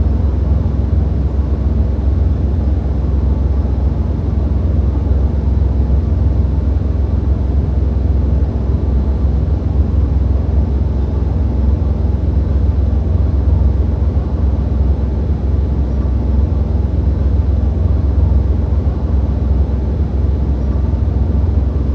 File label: ambience industrial